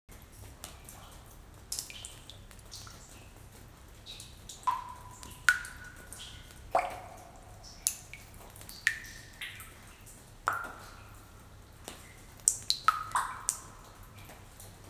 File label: Ambience (Forest Boss); donkey kong country returns 3ds wii wii u 2010